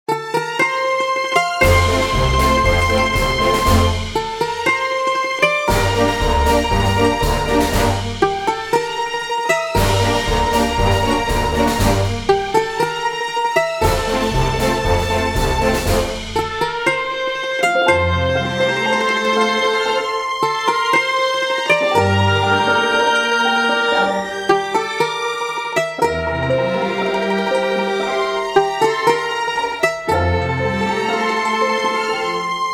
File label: bustling water city